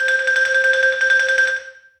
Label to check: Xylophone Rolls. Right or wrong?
Right